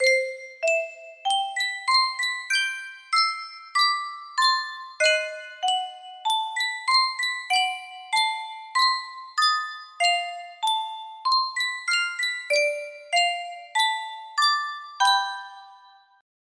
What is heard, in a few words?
music box, music box melody